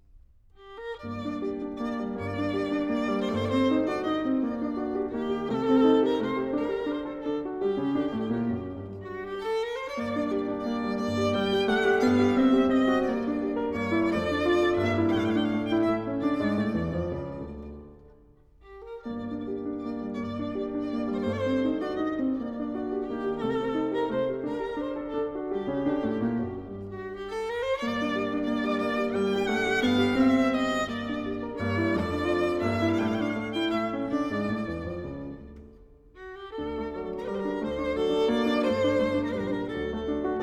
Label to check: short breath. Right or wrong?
Wrong